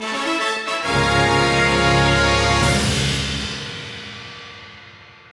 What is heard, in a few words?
Goal Fanfare; Tire and Ice; 2nd Place